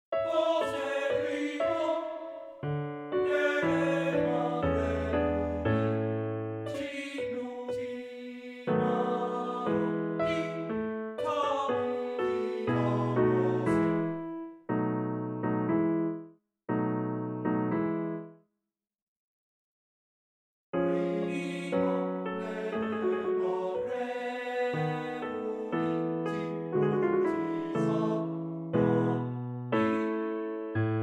Lied per voce e pianoforte; Rosetti; Der Winter

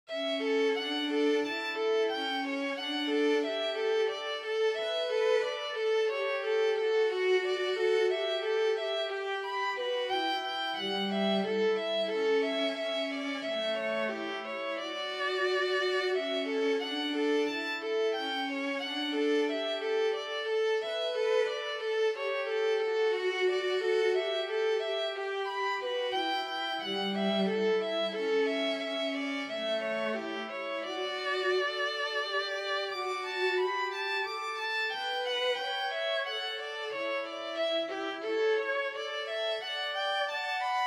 Danza in re per 2 Violini e Basso

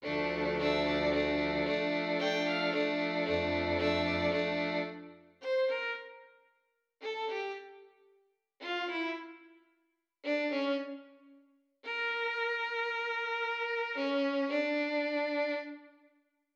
per quartetto d'archi; Les derniers soupirs; Frammento Originale

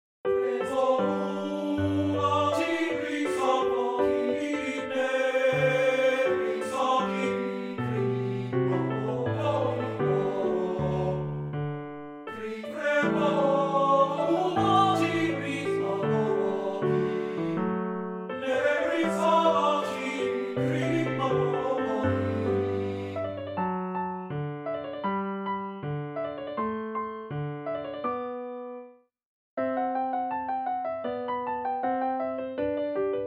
Lied per voce e pianoforte; Kohler; An Minna

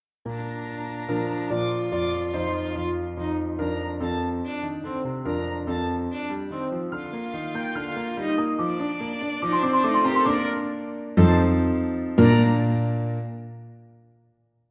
Frammento di una Canzone popolare scozzese in si bemolle maggiore

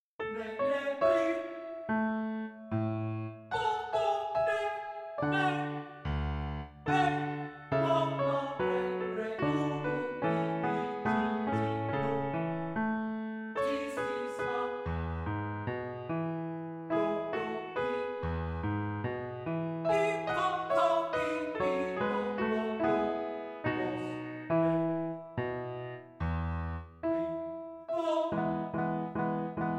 Lied per voce e pianoforte; Christman; An den Mai

